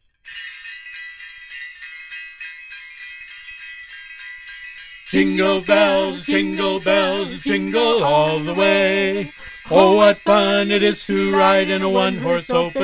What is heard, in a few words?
jingle bells, singing